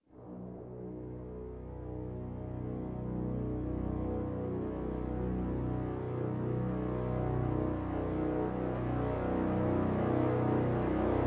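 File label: Crescendo Brass Swell Low B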